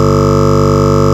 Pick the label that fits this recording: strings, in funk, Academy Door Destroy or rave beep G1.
rave beep G1